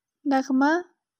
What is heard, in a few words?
melody